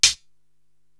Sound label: HH Lofi Close, Korg TR-Rack drum machine